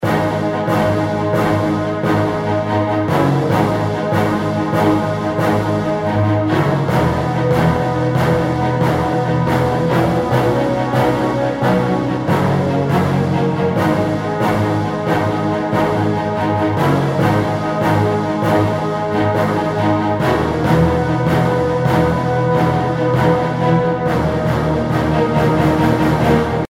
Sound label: Menu loop; edgar